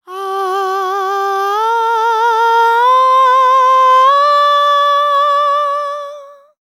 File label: World Voices, INDIA; ILIO; Vocal Planet